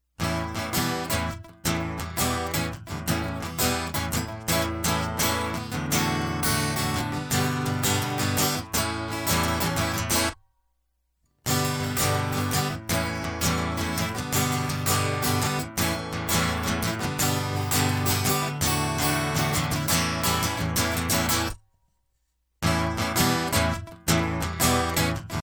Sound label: GTR Loop